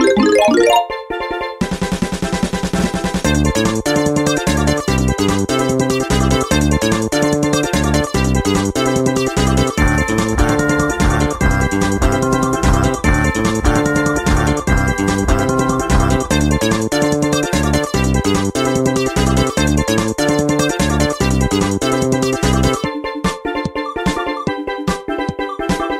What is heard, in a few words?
metal